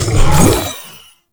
Flying Mouth Attack Fire; Enemies; Warframe; ManInTheWall

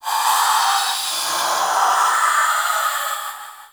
Minion Vocal; Warframe; Stalker Acolyte Minion

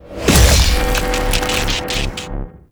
Backstab Cast; Infested Drillbit; Warframe; Infested Lich

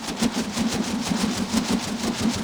Fall Wind Whoosh; Foley; Warframe; Characters; Tenno

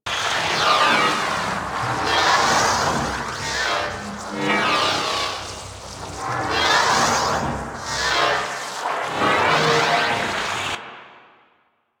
Pillar Ship Missile Explosion Loop; Warframe; Vehicles; RailJack; Proc Ships; Corpus

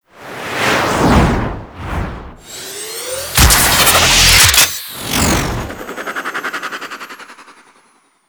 Ship Extraction Release; Vehicles; Warframe; Liset; Liset Flight; Grineer Ship Liset

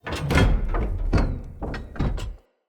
Rotator Shutdown; Encounters; Ode Pollution Tower

